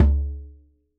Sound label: Djembe; Cymatics; Emperor World Percussion (Teaser)